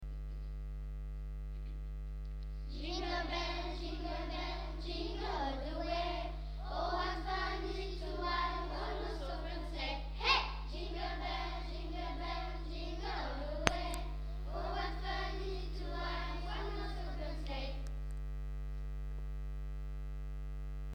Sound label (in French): bell classe